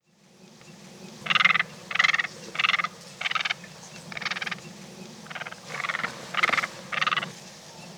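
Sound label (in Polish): czapla biała ardea alba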